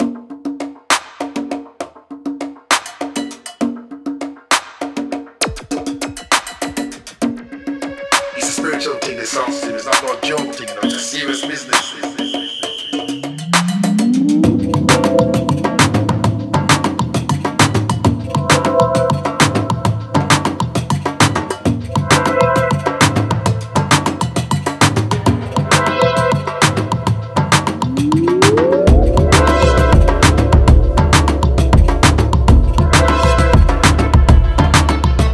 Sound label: honeydrum, breaks